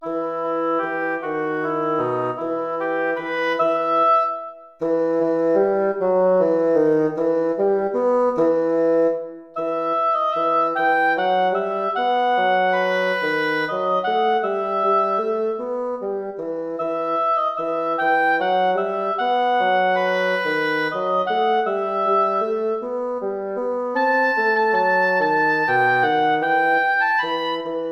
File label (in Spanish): duo para oboe fagot